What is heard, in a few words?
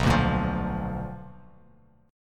B Minor Major 7th Flat 5th Bass Standard; strummed; JGuitar